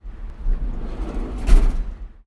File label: RrrrPENG